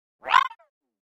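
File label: Electric Whip Tremolo, multimedia element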